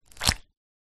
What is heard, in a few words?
nature ice small push melt squelch watery; zapsplat